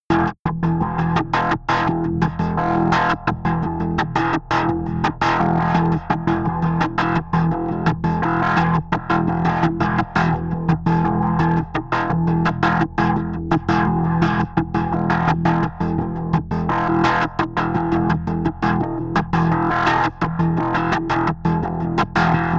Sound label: sequence glitchy bass sequence